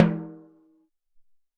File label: Tm Bal